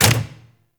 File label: Turret Dry Fire; Lasrian APC